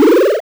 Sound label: bonus